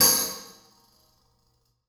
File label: tambourine hit mf; Percussion; Sonatina Symphonic Orchestra